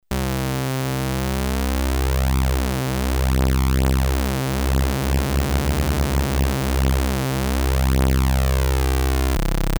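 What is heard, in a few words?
detune, Polivoks duophonic synthesizer; TEST: DETUNE range; Polivoks formanta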